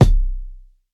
tight lows bass drum sound C key

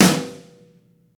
long room reverb acoustic snare f sharp key

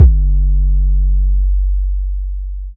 rich low end TR-808 drum machine kick F sharp key

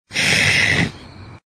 snorts one shot

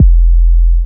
fluffy kick drum single shot f key; kick drum samples

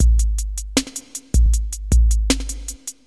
105 bpm hq hip hop drum beat a key

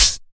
natural closed high hat sound f key; hat samples

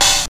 mellow open hat a key; open hi hat

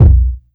small reverb bass drum one shot b key

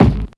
smooth kick drum g sharp key

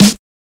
00s short reverb acoustic snare g sharp key

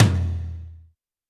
high floor tome drum sample f key; tuned drums f key

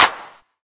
clap sound e key; percussion; single hits one shots

